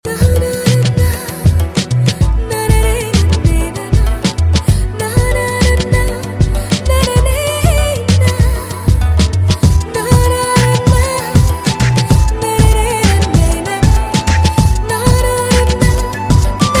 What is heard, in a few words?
sms theme